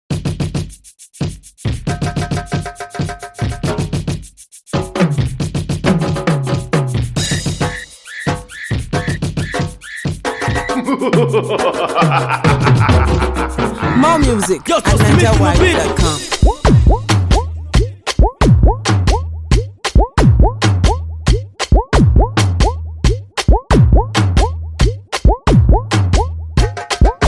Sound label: Afro Beat